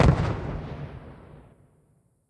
Explode

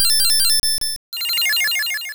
Morning Sun; Pokemon; Gen 2; attack moves; GSC

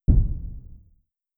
Small Collision

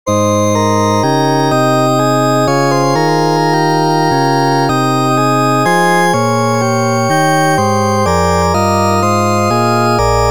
Ending

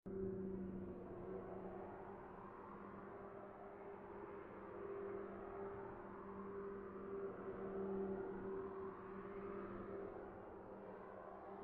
Leadup

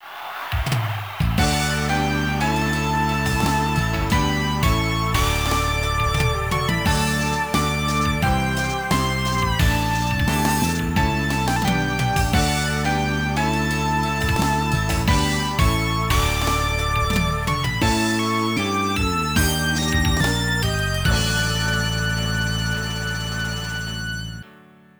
Congratulations!; Versus Net Soccer; Konami GX; arcade

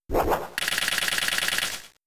Horn Drill; Pokemon; Gen 3; attack moves; RSE

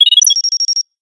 Target Lock; Angry Birds

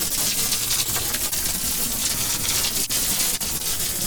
Loop Lightning; Infernal Radiation

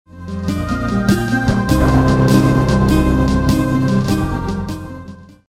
Battle Intro; Swords and Sandals 2

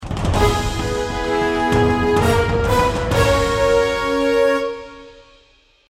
Longbow Fanfare; The Lab; valve

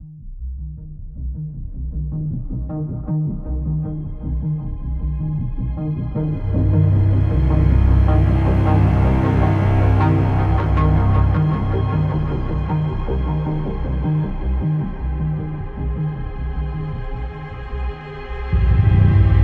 Suspense Oneshot

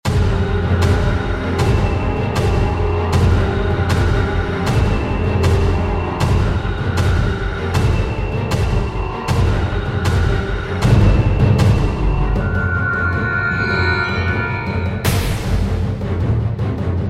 Battle Song; F.E.A.R. Extraction Point

